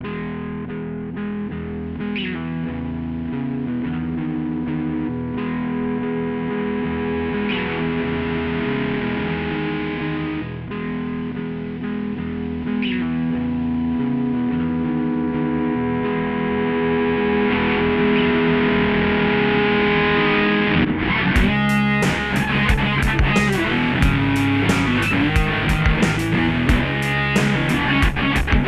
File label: song